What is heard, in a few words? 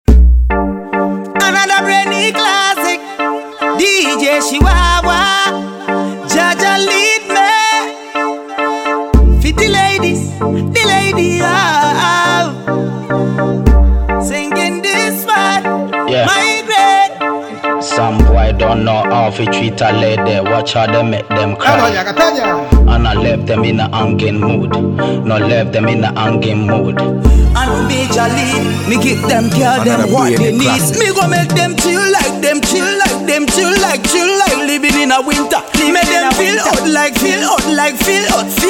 Riddim